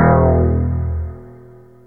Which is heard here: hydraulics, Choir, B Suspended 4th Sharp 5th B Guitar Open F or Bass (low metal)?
Bass (low metal)